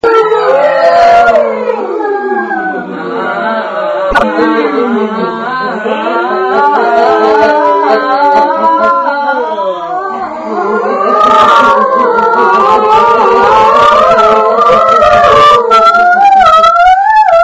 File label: Warm ups; at Deb's Studio